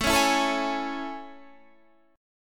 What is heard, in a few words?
A Major 7th